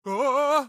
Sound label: rescue scarecrow; run-dorothy